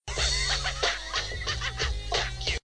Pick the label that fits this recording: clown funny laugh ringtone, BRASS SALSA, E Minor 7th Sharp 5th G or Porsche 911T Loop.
clown funny laugh ringtone